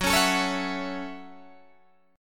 F sharp Minor 7th F sharp, Guitar Open D

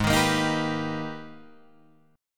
Minor Major 9th, Guitar, Open G; Gsharp; 6,8,8,8,8,9

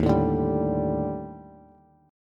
Eb Major 7th Flat 5th, Bass; EbM7b5; strummed; JGuitar

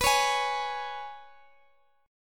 G sharp Minor Double Flat 5th, guitar

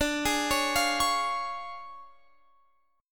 D Minor Major 7th Flat 5th, D

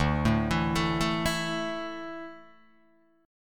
D Suspended 2nd D down Guitar DADGAD

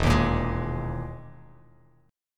E-Major Sharp 11th E, Bass Standard; EM#11 strummed; JGuitar

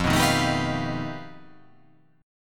F 9th Flat 5th F down

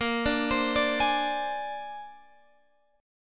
A sharp 9th A sharp, Guitar, Open G

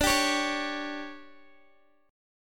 D Major 7th Suspended 4th, Guitar, Open G

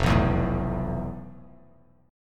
F Major 7th Flat 5th; strummed; FM7b5; 1,2,2,2; JGuitar

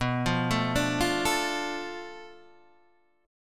B Minor 7th Flat 5th B Guitar DADGAD

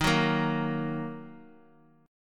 D sharp Minor D sharp; D#m strummed; x,18,16,15

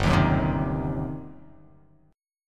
G Major 7th Suspended 4th Sharp 5th, G, down

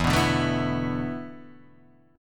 E 7th Suspended 4th, Guitar, Half Step Down

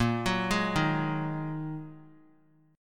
Bb 7th Suspended 4th Sharp 5th Bb 3C,3F,3A,3D; Bb7sus4#5; arpeggiated; 22,25,23,25; JGuitar

